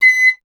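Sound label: flute piccolo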